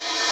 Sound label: Rev Cymbal, Hip Hop